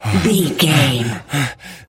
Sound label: voice male breathing sequence short